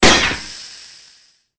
gas shot; Soldier Of Fortune II Double Helix; XBOX

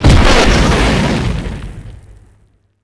laser Hit; impacts explos

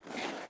footstep slide cement

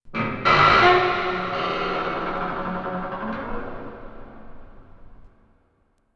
Rocking Metal; Myst III Exile

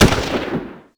Shoot Mp5 1 shot; weapons; Max Payne

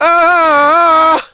sing; Splashdown; XBOX; Amman

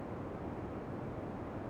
room interior; Soldier Of Fortune II Double Helix; XBOX; ambience

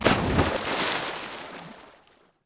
splash large Object; water splashes

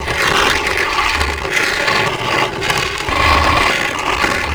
slide conc; terrains; Tony Hawk's Project 8; XBOX

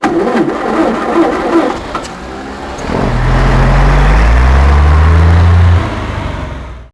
container truck start up; docks; Max Payne

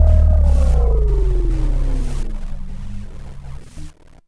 forcefield end; Star Wars Obi-Wan; XBOX

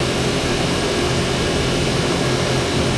swoop bike; Star Wars Knights Of The Old Republic I; XBOX

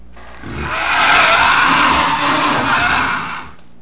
creature; The Elder Scrolls III Morrowind GOTY; XBOX

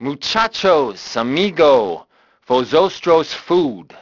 band; Grand Theft Auto Vice City; XBOX

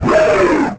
roar; The Elder Scrolls III Morrowind GOTY; XBOX

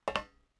metal walk, footsteps

